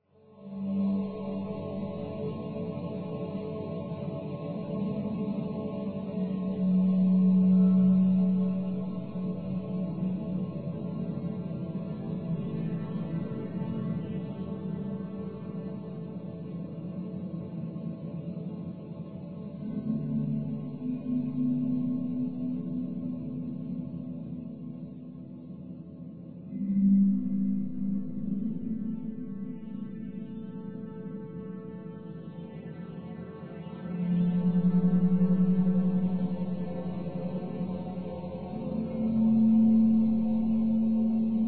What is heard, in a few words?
drone